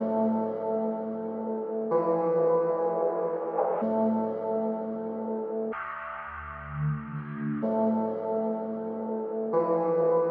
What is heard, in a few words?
126 BPM D Min Organic Chops; Loop Stems; Cymatics; 2022 Melody Collection Platinum Expansion; Fusion